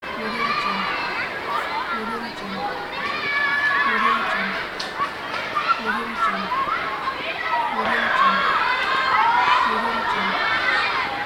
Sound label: school ambiance